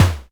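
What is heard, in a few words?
snare, Roland MKS-80 Drums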